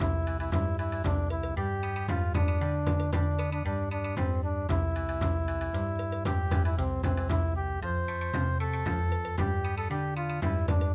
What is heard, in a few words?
Bon Odori; day of winter end